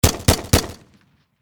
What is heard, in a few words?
Warfare Machine Gun Hk416 Semi Triple Shot Close Perspective; Heckler Koch